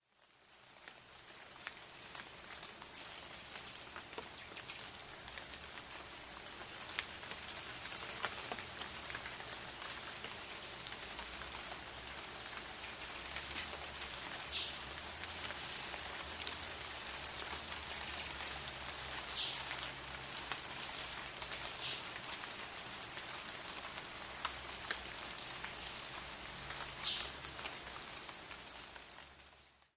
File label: birds rainforest